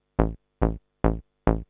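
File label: SYNTH BASS DANCE 140 A; eJay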